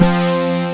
AN SYN, SYN & LEAD; SAMPLE WAVE MIXING 2

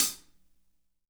HH CL L, Drum 1 Dry; East Collexion

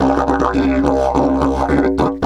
108, DIDGE 108BPM

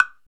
PRC TUNED, Africa; Roland LCDP14 Africa VOL-2; Afro Menu 1

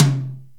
Mid Tom; Zoom RT-234; Kit B

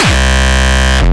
Speedcore Kick

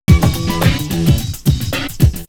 Beat; AMG

